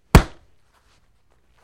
Football Catch Dull

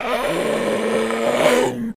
Hyena Gasp Roar

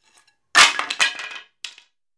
Baseball Bat Wood Cr; baseball fx & games

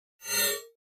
Mouse Over HF Elect, mouse over

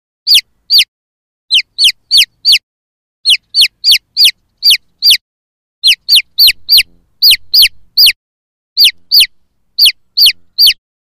Chickens Chirps Chic

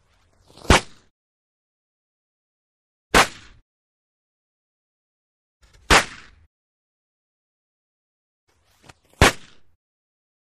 Firecracker Explosi, fireworks

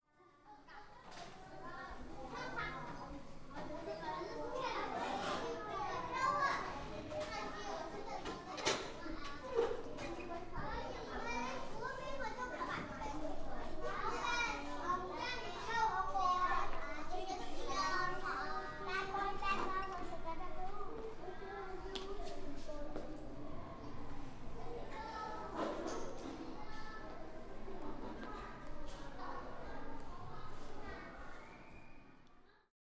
Interior School Hall

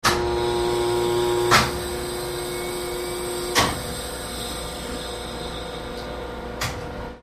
Xray Machine Large, Large X-ray Machine; X-ray Machines 2; Motor / Fan / Switches, Close Perspective. Hospital.

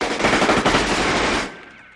Gun Fight Vari Shots, modern

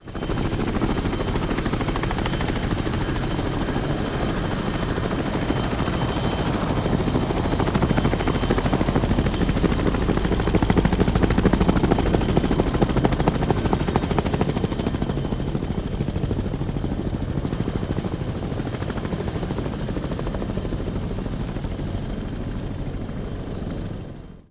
CH-53 Super Stallion takeoff

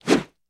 Medium Swish

